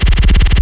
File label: crossbow multishot